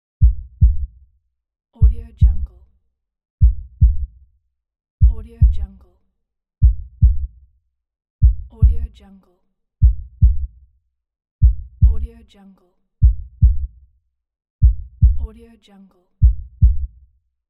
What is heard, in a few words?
heartbeat